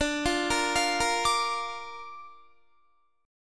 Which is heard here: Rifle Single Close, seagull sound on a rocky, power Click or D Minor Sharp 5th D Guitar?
D Minor Sharp 5th D Guitar